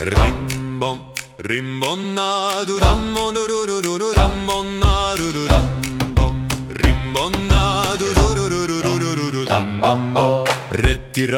tempo rigolo, comptine rythmique

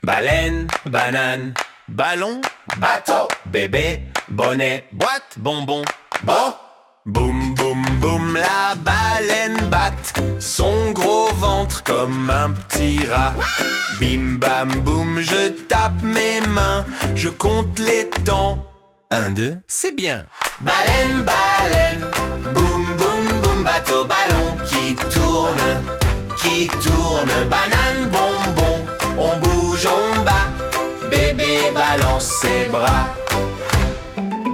la baleine qui bat, comptine rythmique

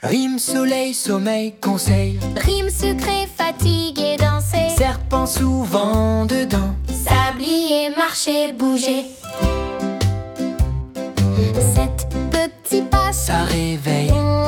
comptine rythmique, soleil sur les souliers

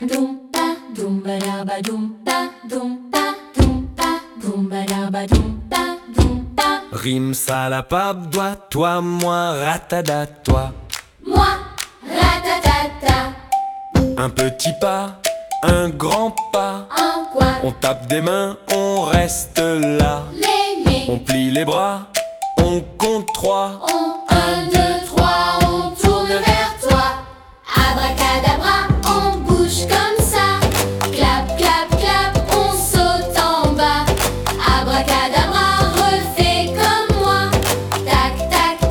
abracadabra on bouge comme ça, comptine rythmique

